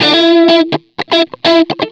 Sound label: 125 a, guitar licks; PS-28 Vocal House Samples; instrumentloops